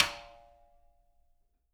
SN RIM, snares; Sampleheads; Peter Erskine Living Drums

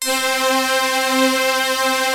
ELKA STRINGS, ENSONIQ TS-10; Rubber Chicken Software Sample Bank

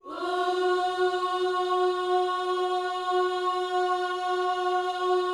OOS F#, Vocal Gospel

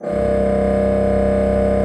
C1, Cathedral Organ, HOLZPRINZ.; Propeller Island

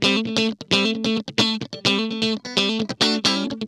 GUI, licks; 130 LICKS

